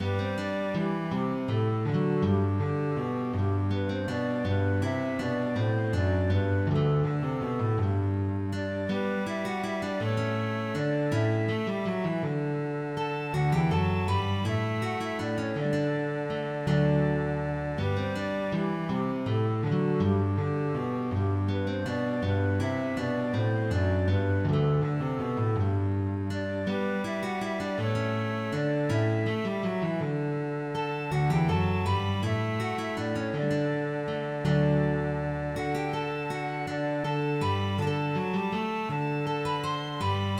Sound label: Gigue